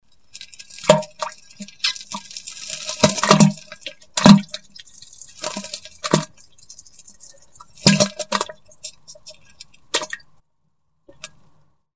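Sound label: sounds of diarrhea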